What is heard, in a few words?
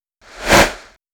pop project with reverse